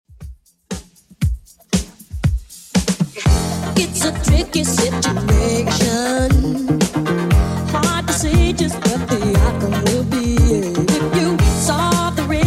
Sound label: 80s Redrum 118 bpm CLEAN